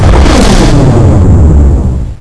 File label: nuke explosion; Alien Killer